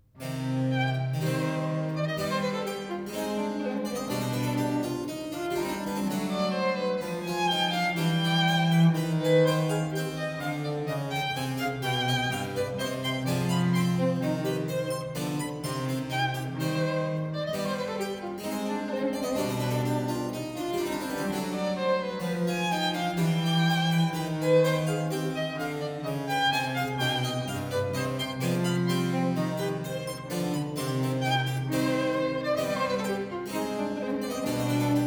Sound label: Canon a 2 in unisono; J.S. Bach; Musikalisches Opfer BWV 1079; A Musical Offering